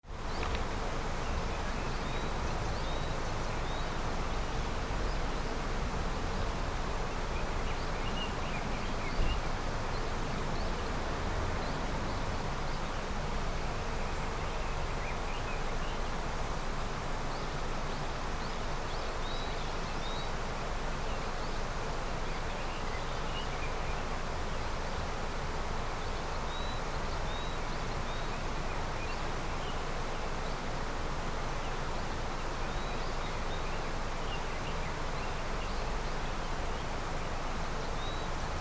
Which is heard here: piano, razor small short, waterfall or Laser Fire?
waterfall